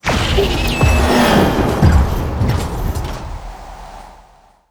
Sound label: reinforce all, ui; Stellaris